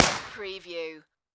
Submachine Gun HK MP5

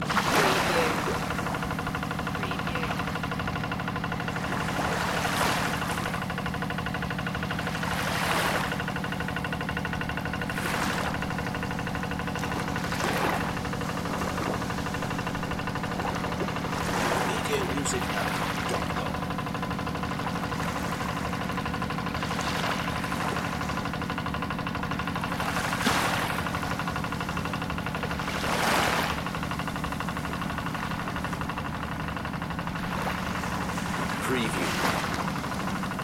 fishing boat and waves loop

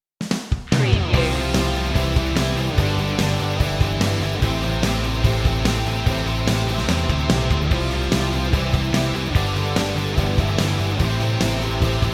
rockin no vocal

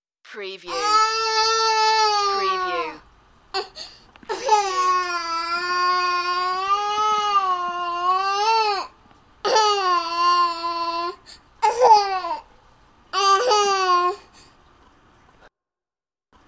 Baby Crying

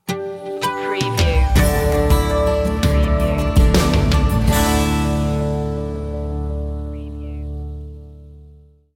water gardens sting

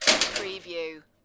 Metal Gate or Metal Door Slam

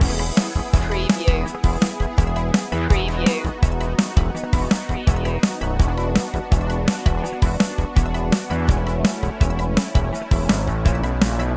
antiquated loop

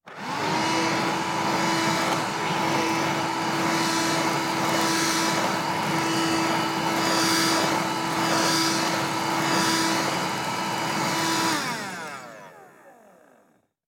Vacuum On Run Off

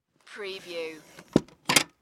Chair Hydraulic Raise

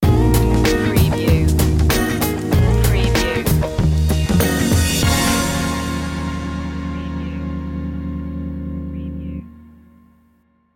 cool check sting

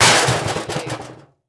Impact Garbage Can

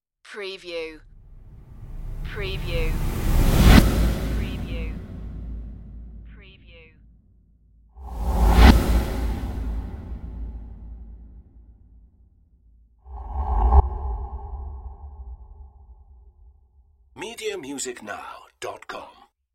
scary whooshes

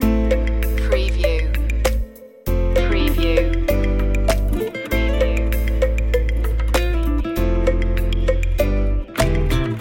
electro latino loop

